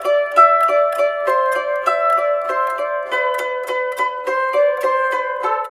SITUR, SITUR PH; Spectrasonics; Heart of Asia

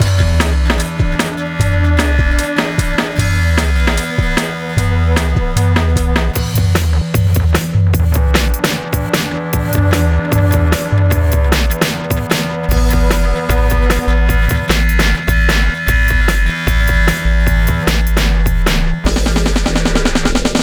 Rhythms 151 Ebmin, Hardcore Breakbeat; Big Fish Audio; Rhythms 4 Life

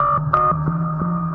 FX, Futurist Drum & Bass

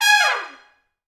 Pop Brass, G#4; Best Service; ProSamples vol.19; AKAI

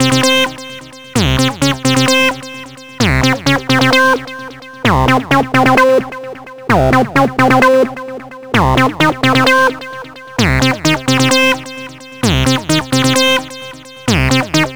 NRG Lead, trance; Sonic Foundry (Sony Creative Software); Trance NRG VOL-1

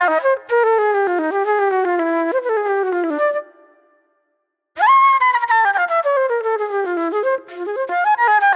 Raw Funk Flute

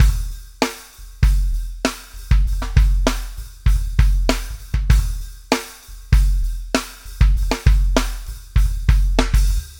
SHRED, Backbeat

